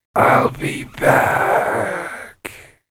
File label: death; the hidden